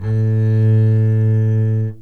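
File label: double bass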